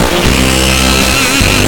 disto fx, big beat; Big Beat Samples & More; AKAI; DISTOFX KIT

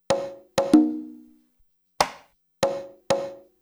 LOOP, CONGAS, Percussion; InVision Interactive; Percussion Slam

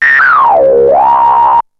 ANALOG, SFX; Syntec; Wall of Sounds; TECHNO-BOX

